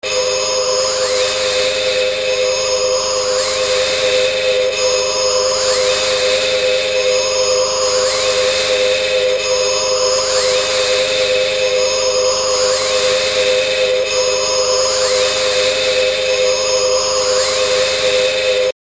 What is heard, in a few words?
ufo Flying